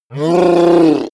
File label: reptile dying choke